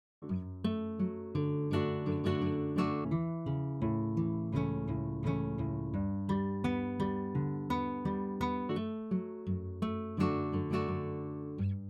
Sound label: Guitar Nylon Picking Strum 80